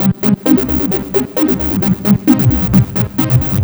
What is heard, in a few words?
Trance Synth; Mio 8670